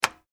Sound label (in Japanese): 鍵 を かける